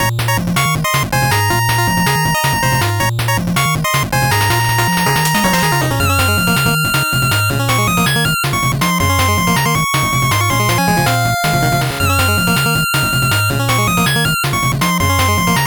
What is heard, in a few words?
8bit bgm fast